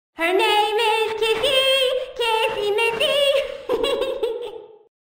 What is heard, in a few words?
kissy missy